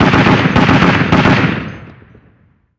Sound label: gun 50cal med; amb elements; modern warfare 2